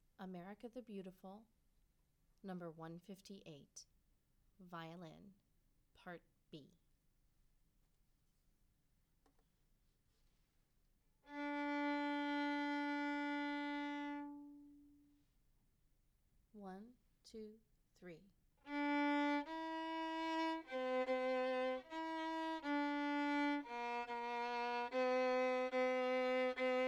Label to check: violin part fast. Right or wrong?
Right